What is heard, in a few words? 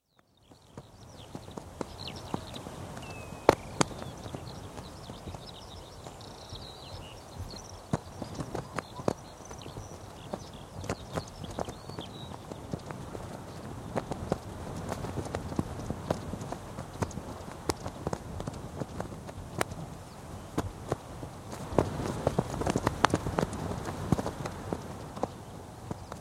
Flag flapping in breeze with birdsong; Part of Wind (Sound Effects:Weather); BBC